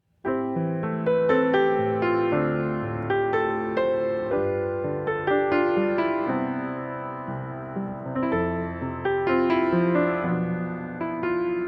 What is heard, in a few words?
piano